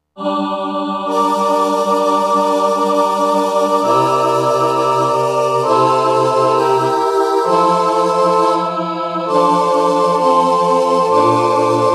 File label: SATB